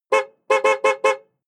sports car ext horn rhythms

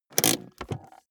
car bmw e46 interior handbrake pull up

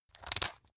pepper mill slow; berufe; gastronomie; soundpool

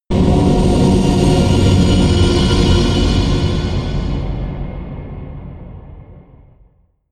whoosh turbine swish; swooshes-swishes

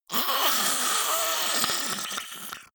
monster choking zombie idle high; comedy-cartoon; soundpool

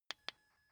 car key button click signal

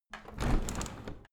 window handle open tilt hard; objekte; fenster

